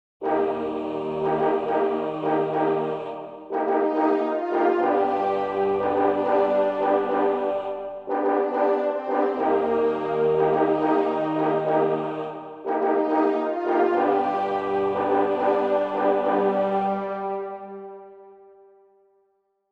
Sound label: hero flying background, music; pbskids; go studio